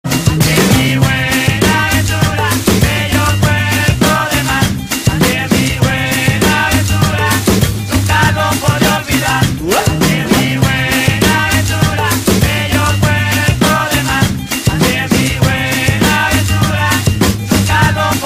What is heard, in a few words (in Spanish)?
ambiente tropical